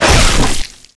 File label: Flak Cannon Release; weapons; Requests Studio; UT3